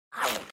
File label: loading